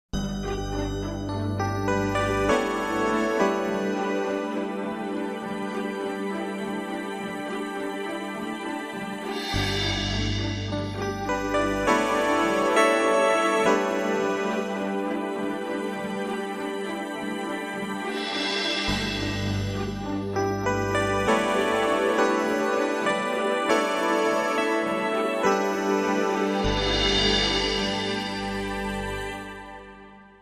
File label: Positive Discovery